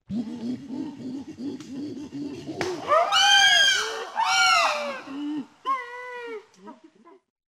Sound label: chimpanzee